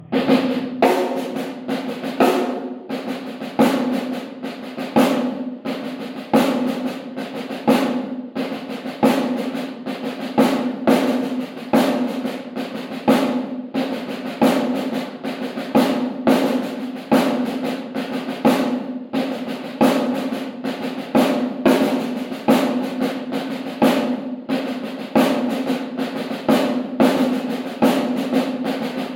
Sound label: samba snare